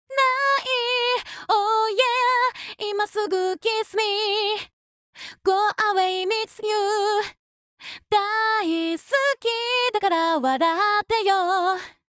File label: Vocals Off